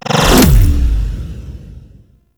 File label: Cast; The New War; Lua Valley Ext; Aerolyst; Mag; Cinematics